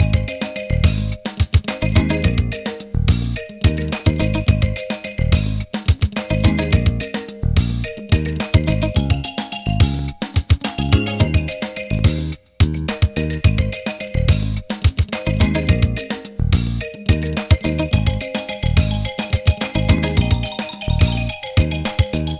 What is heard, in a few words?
Music